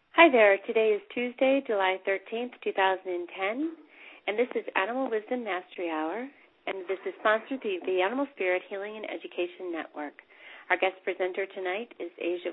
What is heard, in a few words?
Dolphin Meditation